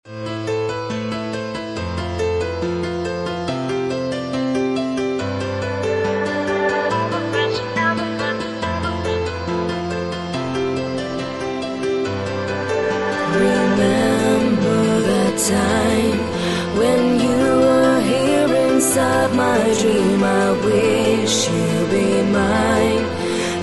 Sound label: Lonely Piano Rock